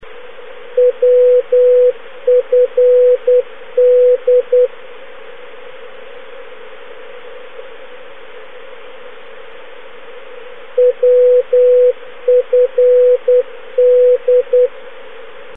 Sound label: woodford aerodrome; manchester, uk (now closed); europe